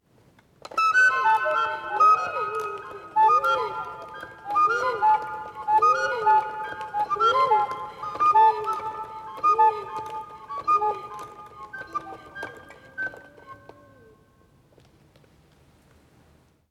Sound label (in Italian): organo